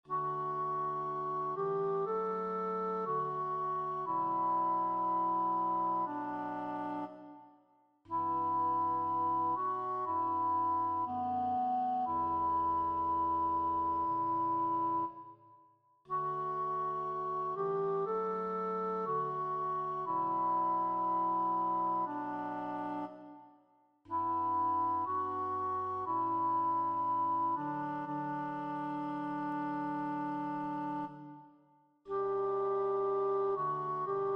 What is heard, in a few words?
soprano; In the Bleak Midwinter (D)